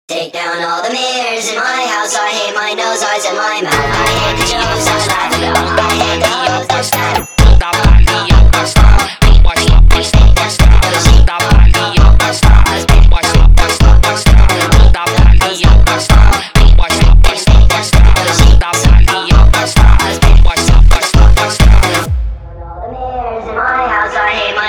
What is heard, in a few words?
funk Sped Up